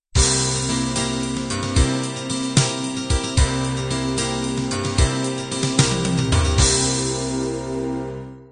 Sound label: celtic ballad end